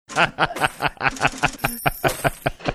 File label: laughing